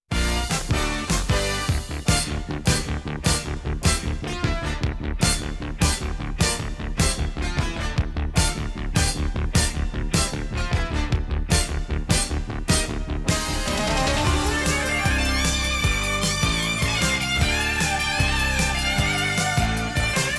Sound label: Up Tempo 'free Rock', music